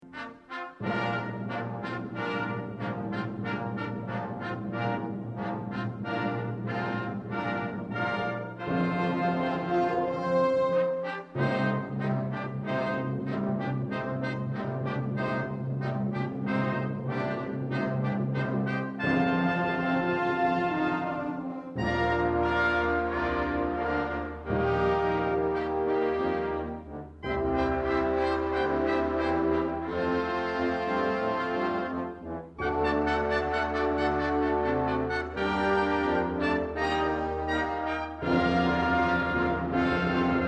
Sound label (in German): jubilaeumsfanfare